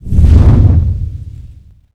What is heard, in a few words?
dragon flapping wings